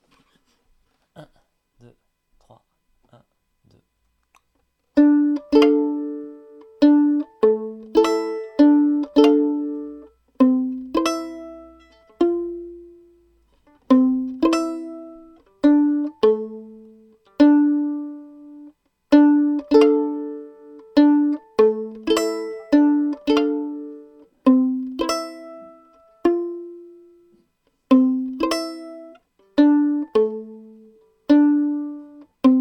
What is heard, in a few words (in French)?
Passe-pied de Vieuxville - pizz